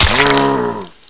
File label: zombie barn pain